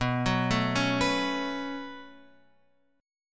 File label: B 7th Suspended 2nd, Guitar, Open F; B7sus2; arpeggiated; JGuitar